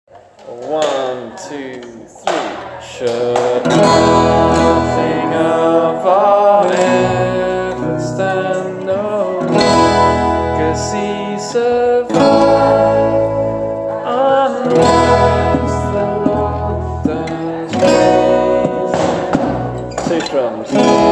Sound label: slow